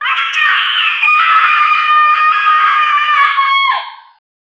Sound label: scary scarecrow laughing